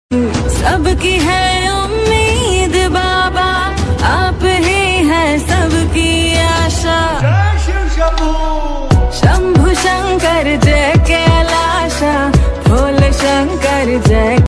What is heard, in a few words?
ringtone; bholenath; mahakal